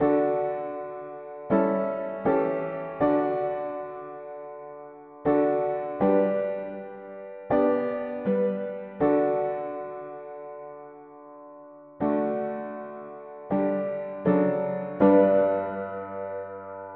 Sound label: mono-piano, hymns